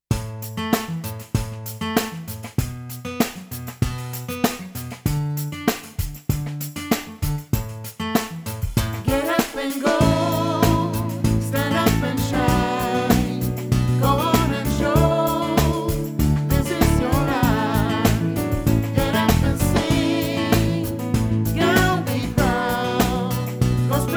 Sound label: Tenor & Alt